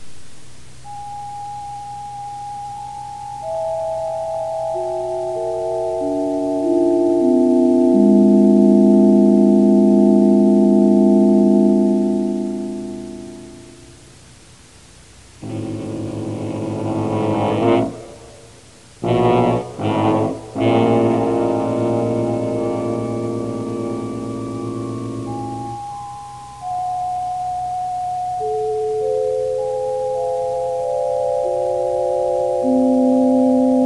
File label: dramatic